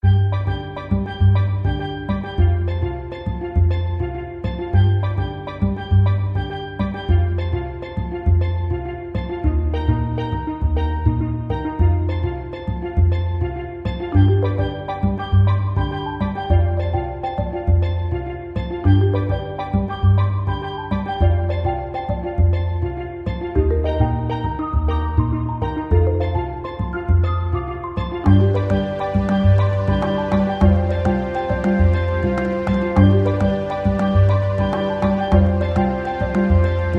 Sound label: Music